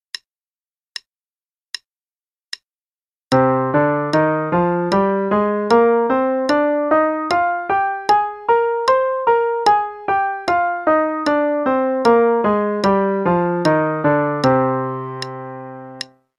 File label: c natural minor Scale only, qn=76; CM Level 4